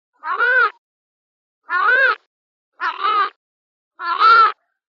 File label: archaeopteryx sound; nhm; dippy on tour